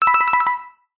cartoon musical riff cheeky electric piano blink flutter